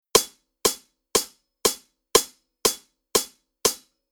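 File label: Rhythm Bar Beat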